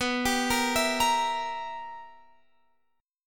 B Major 7th Suspended 4th Sharp 5th Guitar Open F, chord; arpeggiated; x,18,19,17,19,17